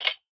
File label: Tool; Game rip; MiSide